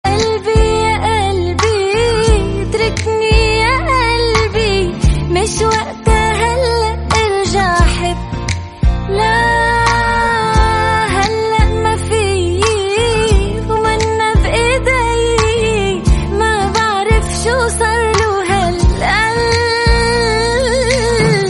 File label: arabic song